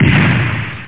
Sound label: crash; sneech